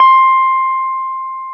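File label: GUI Sus C 5, guitar; Best Service Gigapack 2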